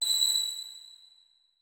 notes, keyboard; Hideout Furniture

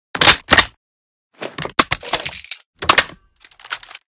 unjam, weapons m249; M249 Reanimation; librarian m249

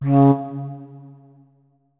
flute notes; Hideout Furniture

